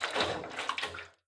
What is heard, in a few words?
contents, metal barrel; physics; props